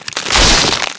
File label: wattle fence; World of Tanks Blitz